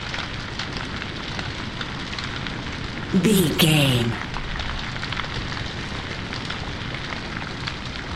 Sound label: fire burning flames crackle loop